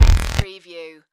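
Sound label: Production Element Hit Distort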